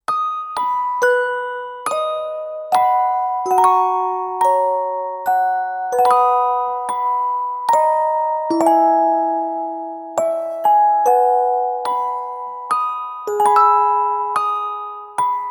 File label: Music Box Version